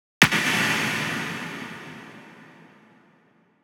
Water Snare

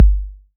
808's

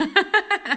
Girl Laugh, Vox

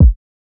808 Kik; Kicks

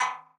Percs

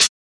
Closed Hats

